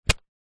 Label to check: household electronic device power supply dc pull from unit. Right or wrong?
Right